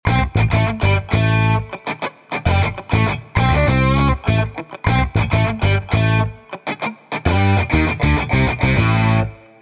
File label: Funk